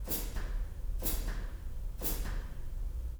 choke cymbal

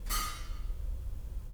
splash cymbal

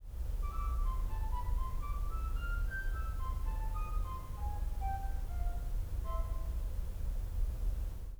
concert flute

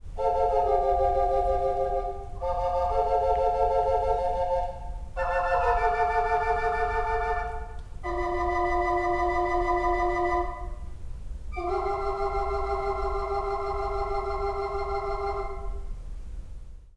main tibia

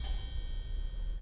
finger cymbal

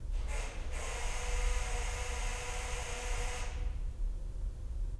duck call

